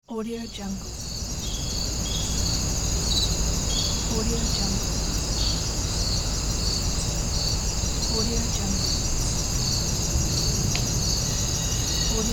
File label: afternoon ambience